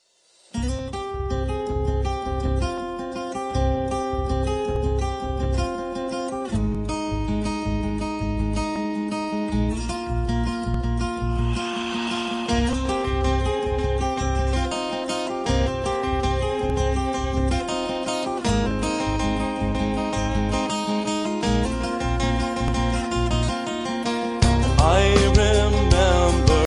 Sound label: acoustic